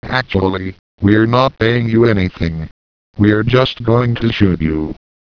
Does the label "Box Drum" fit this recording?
No